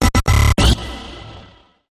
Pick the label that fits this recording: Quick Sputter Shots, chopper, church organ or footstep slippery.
Quick Sputter Shots